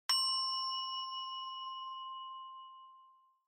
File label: Ding Long